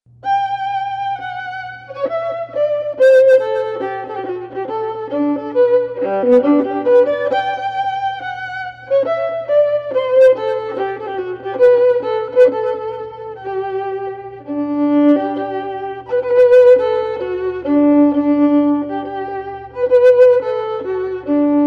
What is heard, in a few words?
wedding march violin